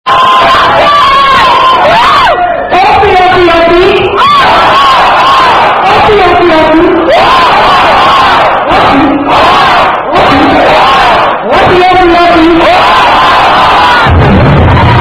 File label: Aussie Chant At Concert In Sydney, Aussie Aussie Oy Oy Oy